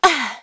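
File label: Vox Landing, English; kingsraid; voices heroes en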